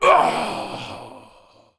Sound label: dying groan